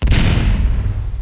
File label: Magic; Majesty; OnlineGame